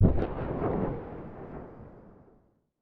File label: explosion distant; Rust